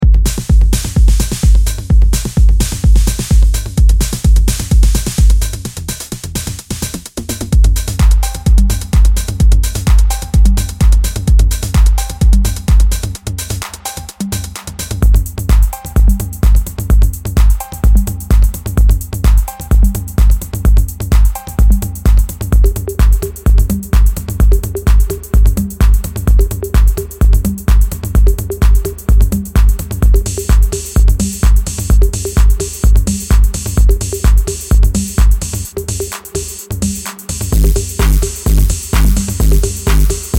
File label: Warehouse Drums